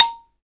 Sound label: BALA, Balaphone